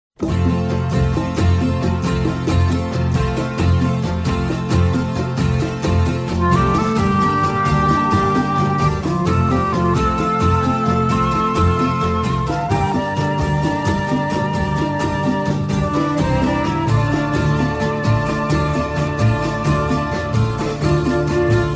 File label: Orchestra